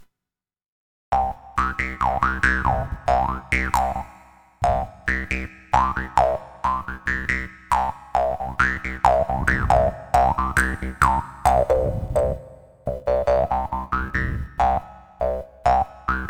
Arpa

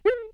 Cartoon Saltando

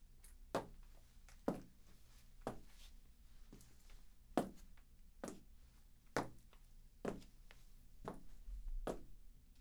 Pasos Tacones

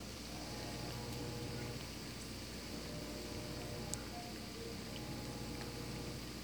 Ambiente Cocina

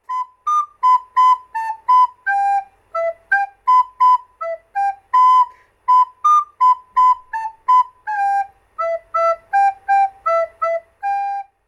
Tocando La Flauta

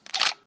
Camara

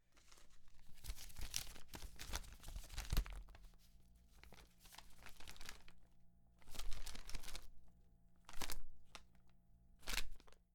Papel

